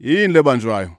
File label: Vocal Chirp, African Voices